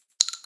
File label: case, CAWS; Caliber